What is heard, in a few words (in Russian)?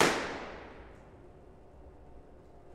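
balloon explosion single short distant muffled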